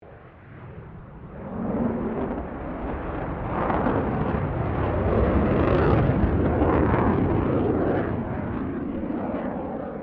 Airoplane Jetfighter F16 Fighting Falcon Maneauvering, Airshow; Avalon Airshow 2009; Airplanes (Sound Effects:Vehicles)